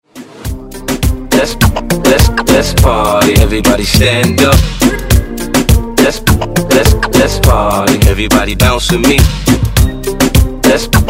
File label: Afro Moombah; Redrum